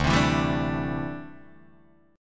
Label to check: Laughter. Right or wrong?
Wrong